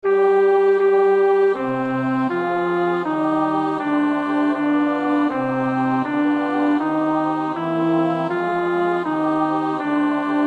bas; Nieuw Liedboek; NLB.342 In God de Vader op zijn troon

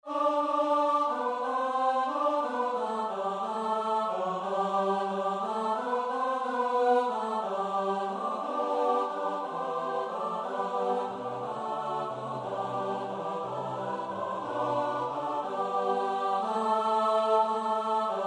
S-A-T-B; Nieuw Liedboek; NLB 479 Een lied weerklinkt in deze nacht

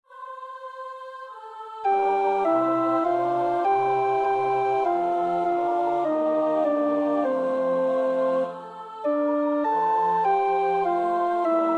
Psalm 116 (Koor) (alt); Oefenbestanden

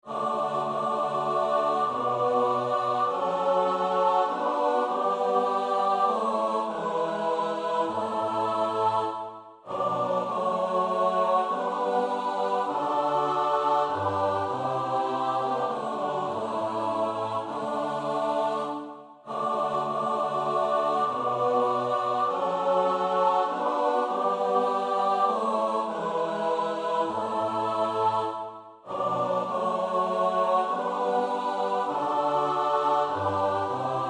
Psalm 46 (S-A-T-B), Oefenbestanden; Schütz (Heinrich)

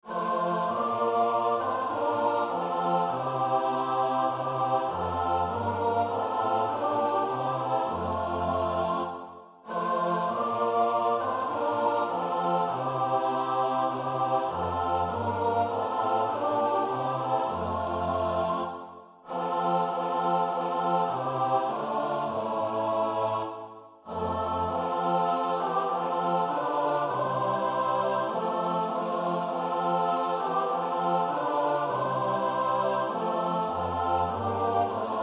S-A-T-B; Nieuw Liedboek; NLB.438 God lof! Nu is gekomen

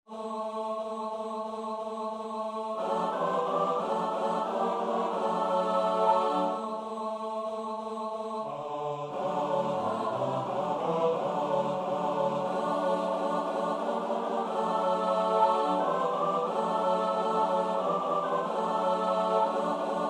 Preces (S-A-T-B), Oefenbestanden; Preces en Responses

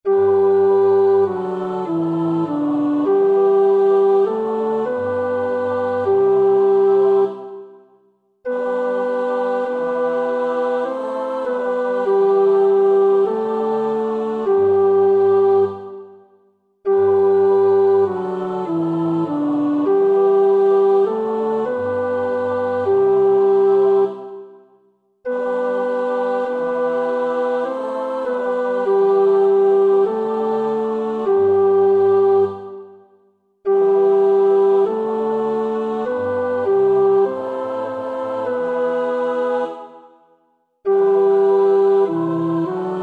tenor; Nieuw Liedboek; Psalm 25; heer, ik wil mijn hart verheffen

